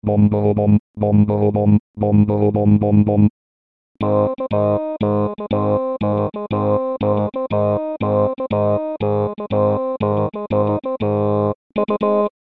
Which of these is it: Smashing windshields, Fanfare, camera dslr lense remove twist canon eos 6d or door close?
Fanfare